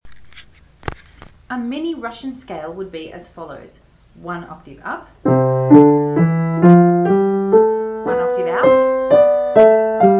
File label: mini russian scale